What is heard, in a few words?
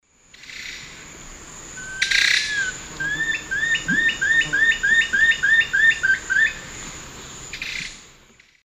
Nasica longirostris, song; S. America; Eastern Lowlands